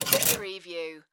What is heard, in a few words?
Hanger Hang Shirt